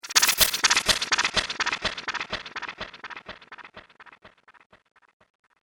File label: Spell Of The Enchantress, magic sound effect